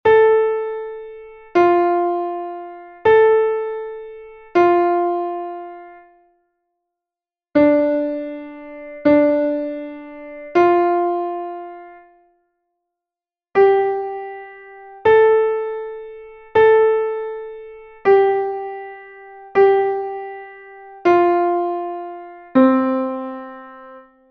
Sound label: 16 notes g rests